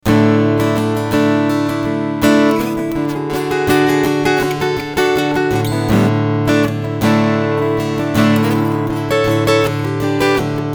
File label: Strumming Fishman EQ FULL